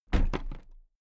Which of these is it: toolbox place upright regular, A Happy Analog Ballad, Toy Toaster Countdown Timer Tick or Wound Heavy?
toolbox place upright regular